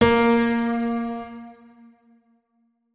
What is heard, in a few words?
notes, keyboard piano; Hideout Furniture